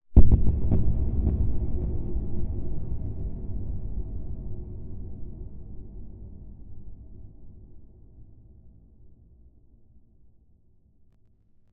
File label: sound design deep thud delay reverb